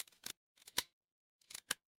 mechanical equip

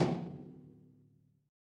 impact metal hit

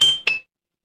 ui chime

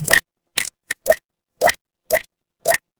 water drip

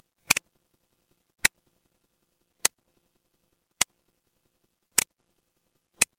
fire electric spark

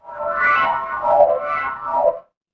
magic scifi teleport

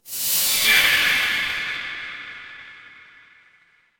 magic scifi spell

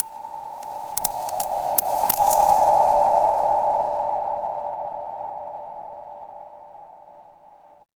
horror wind whisper

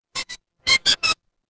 cartoon squeak

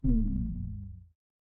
feedback fail

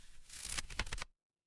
paper fabric page turn

